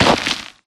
Mvmt FS Jog Dirt Layer; AI Stalker; Titanfall 2

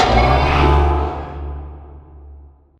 Prop Rack Start Close; Prop Warehouse; Titanfall 2; Boomtown

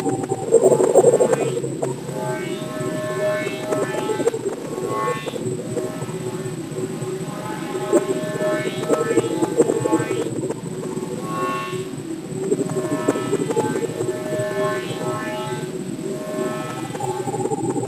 digital distortion; Titanfall 2

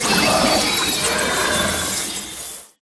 Reboot Power Down; AI Stalker; Titanfall 2

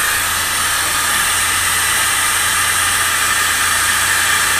steam medium; boneyard; Titanfall 2

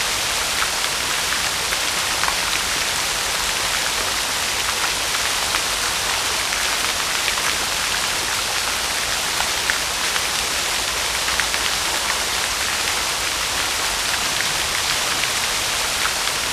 splash fountain; corporate; Titanfall 2

